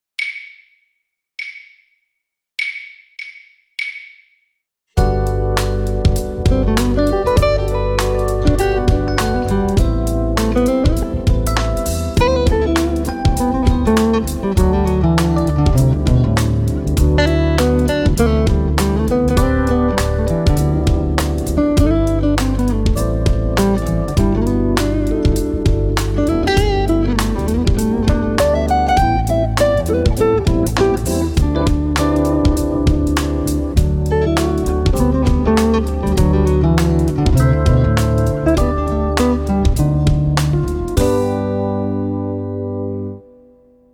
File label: trio 100 BPM, fluit